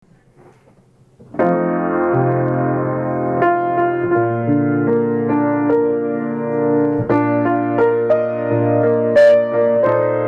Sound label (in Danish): an irish akkompagnement